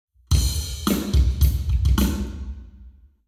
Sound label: Hall, Drums 0deg; eBreve